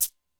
Marabasa, Alesis D4 drum machine

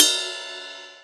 Ride Cym, EMU PX-7 Drum Machines

